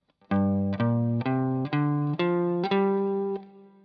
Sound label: G minor pent shape 3 of 5, guitar